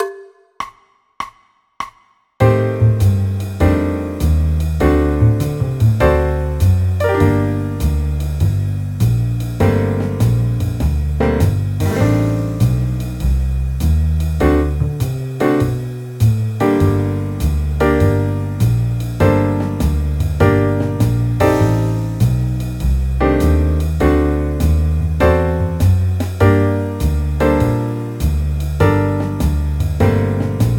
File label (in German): g moll akkordverbindungen